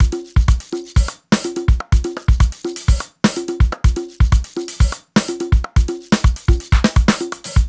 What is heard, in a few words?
top full, 125 Beats